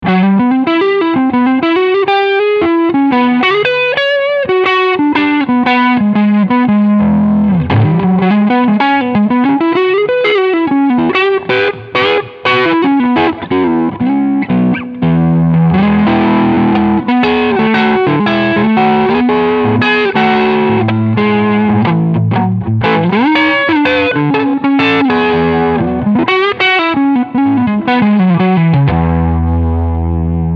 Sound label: Med Gain